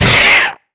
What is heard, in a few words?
pain, headcrab black; creatures; vox